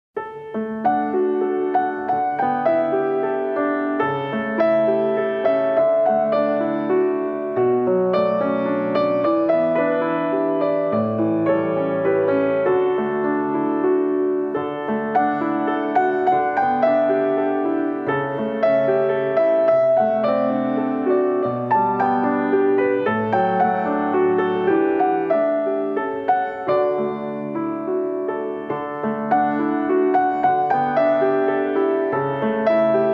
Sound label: piano